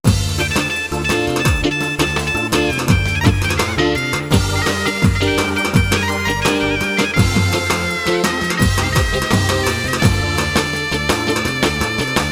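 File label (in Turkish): Aydın Zeybeği, Roland BK-5OR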